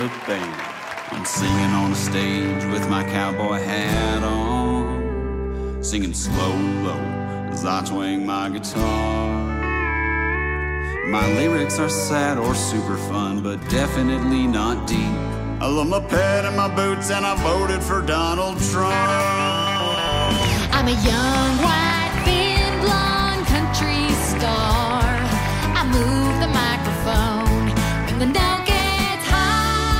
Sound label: Country song